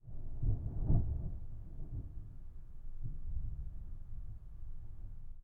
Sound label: thunder; netent; deadoralive